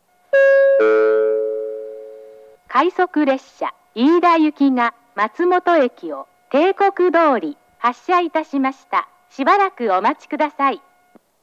hirooka rapid, station; jr-east; iida

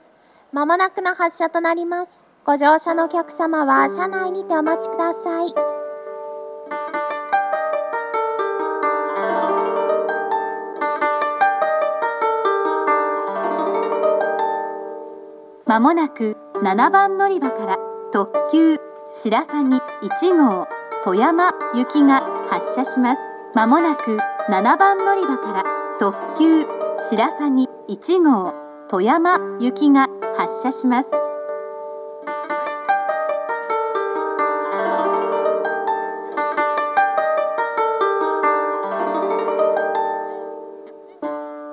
kanazawa limited express; toyama; jr-west

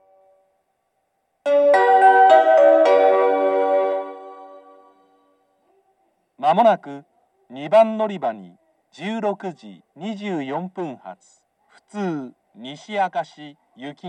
rokkomichi local nishi akashi; station

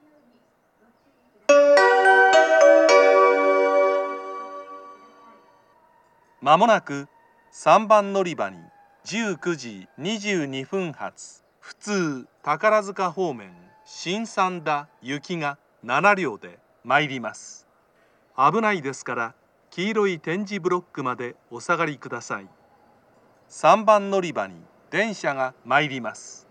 local shin sanda, amagasaki; station; jr-west

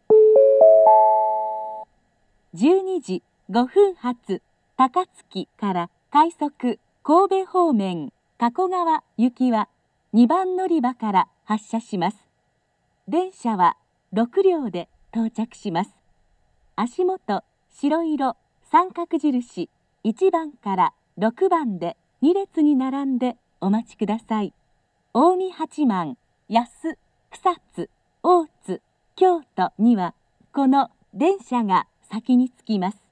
local rapid; hikone; kakogawa; jr-west

